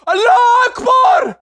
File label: charge, Voices Emotes